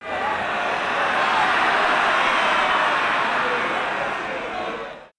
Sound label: little aah